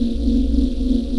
Relic

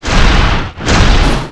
Dragon Run

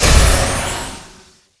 body force shot

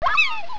Mantrap Atk; Seer; GamigoNA

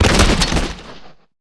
lizard man knight die

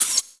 Spider Dmg

